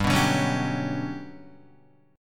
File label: G Major Sharp 11th, Guitar; Listen to GM#11 strummed; GM#11 Chord